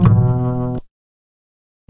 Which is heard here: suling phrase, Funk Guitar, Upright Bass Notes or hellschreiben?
Upright Bass Notes